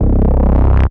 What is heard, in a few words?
C Bass, DnB One Shots; Electronisounds; DnB Rebellion